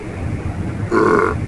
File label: Air Elemental Defend Sound